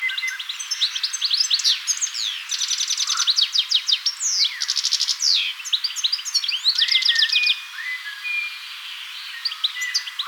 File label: goldfinch song; vogelstimmen